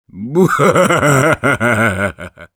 laugh evil, ru; Зловещий смех 2; Sniper; Насмешки снайпера

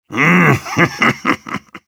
Soldier laugh short, ru; Короткий смешок

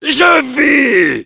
heavy battle cry, fr; Je vis !; Réponses du Heavy

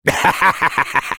laugh happy; Sniper; fr; "(Rire joyeux 1)"

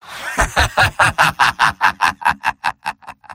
laugh happy

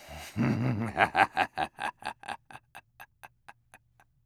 laugh evil

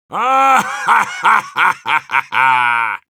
yell, ru; Heavy; "Вахахахахаха!"

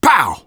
gunslinger punch; Engineer

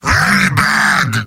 negative vocalization; Heavy

